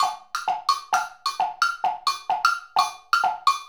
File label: Wood Blocks and Bells